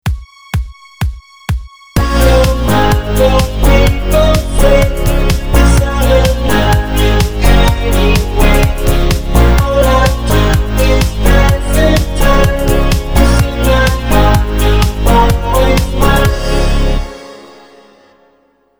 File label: Filter Freak; Sony Ericsson; PlusFourSix; Zoe (Z520)